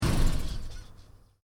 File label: Engine: Stop Engine, car; Cars; Vehicles; Stuart Duffield